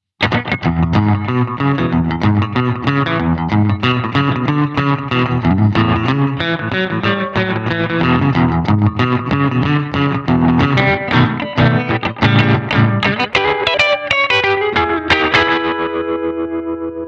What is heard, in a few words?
Tokai LC-85 – Fender-style – tremolo – tape delay; rockabilly